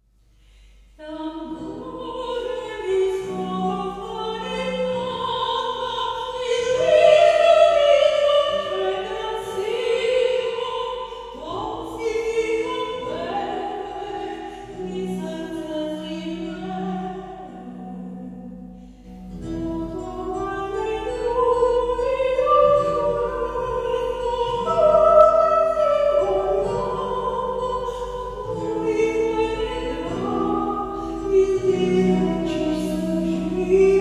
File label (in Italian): voce femminile; Izvorče